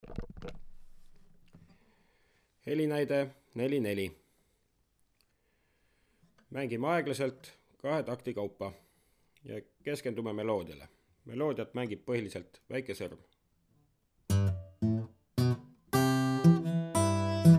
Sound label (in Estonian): aeglaselt juhistega